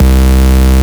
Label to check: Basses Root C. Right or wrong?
Right